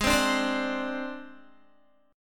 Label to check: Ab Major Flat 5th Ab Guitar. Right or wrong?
Right